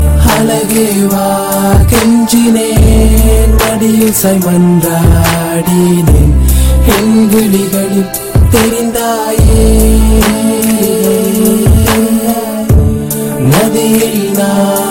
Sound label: Tamil Lovely Song